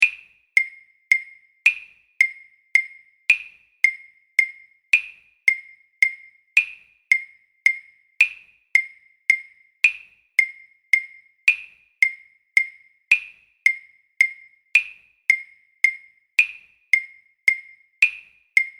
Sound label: Click, drum kit; Step 1 List B